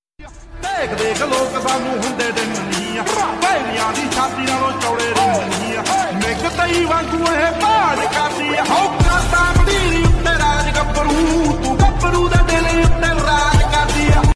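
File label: dillute ringtone punjabi; pnjabi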